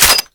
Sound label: glock slide, weapons; kuilu